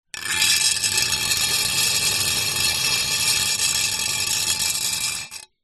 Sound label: impacts glass small pane sheet thin scrape on ground